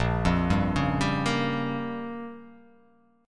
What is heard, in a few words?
Bb Minor 6th, guitar; arpeggiated; JGuitar